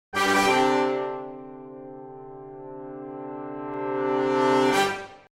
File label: darth vader brass